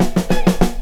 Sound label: DRMROLL; E-Lab; X Static Goldmine 2; akai